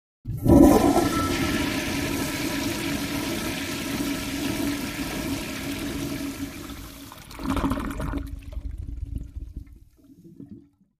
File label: toilet